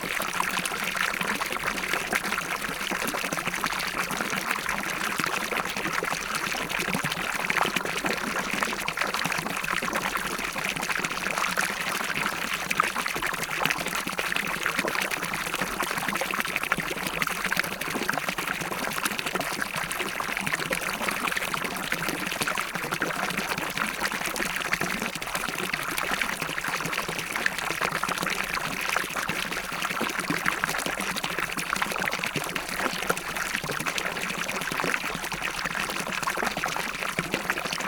Stream Small, Mountain Streams & Rivers; Soundbox Library

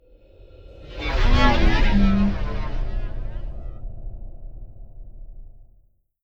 Whoosh Twisted Vocal, Impact; Bottle Rocket Fx - Impact Collection

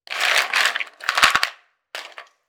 Ice Cube Moving; Membrans - Ice Cold Sound Pack 01

